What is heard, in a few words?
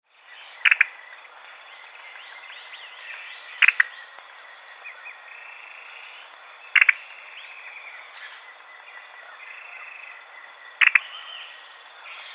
Poecilotriccus plumbeiceps; Estancia Golondrina